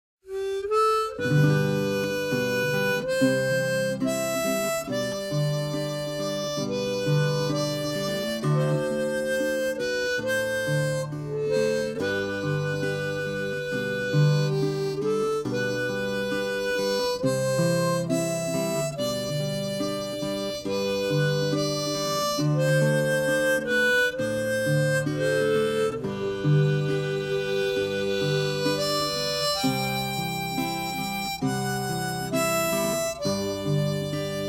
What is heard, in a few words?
Drum & Bugle